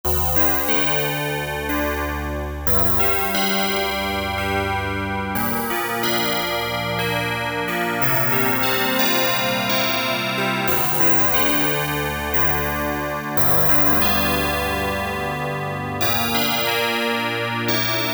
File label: MALLETS Aurora Bell; Apple GarageBand 2